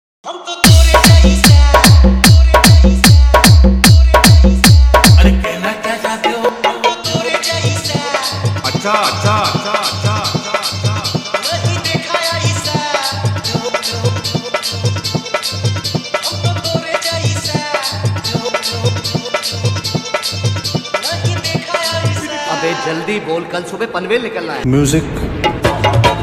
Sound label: Bhojpuri Dj Remix Songs Tabahi Dance Mix